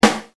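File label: coin drop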